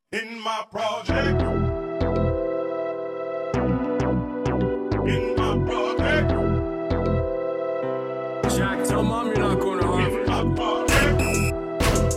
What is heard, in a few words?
808 beat